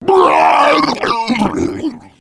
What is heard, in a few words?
male boomer vomit; voice